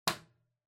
Light Switch-Box-Rocker (Wall-Hard)

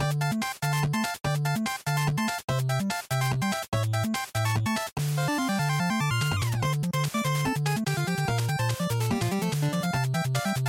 Action Stage Loop, bgm

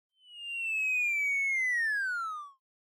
Motion Falling Whistle Fade In Long

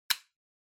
Lighter (Close)

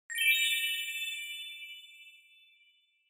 Bell Accent, Dry

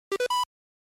Arcade Shooter Score

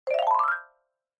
Xylophone Fast Long 3 Up

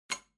Wall Outlet Cover Open

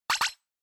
Surprise High; Onoma

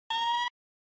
Retro Anime System

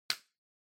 Kitchen Timer Cover Open

